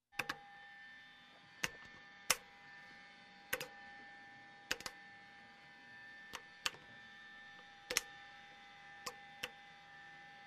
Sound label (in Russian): Zvuk tanka kak perekluchatel; Звук танка-14, как переключатель